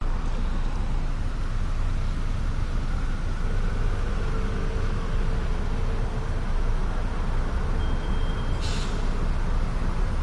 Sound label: vancouver night traffic hissing rumbling roaring traffic cars engines; davie st; howe st; honking; your free sounds